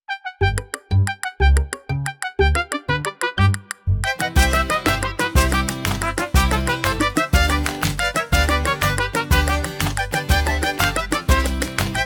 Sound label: Warm Up fireworks hands; music pre primary